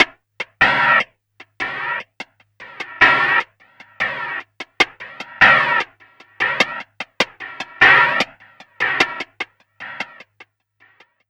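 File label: PARKY hi-hat; Big Fish Audio; Uncivilised G'rooves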